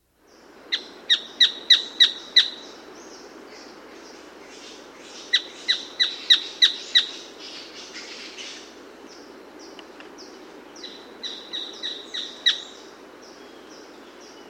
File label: Striped Foliage-Gleaner; S. America